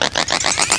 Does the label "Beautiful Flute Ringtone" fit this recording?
No